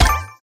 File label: collect; pinball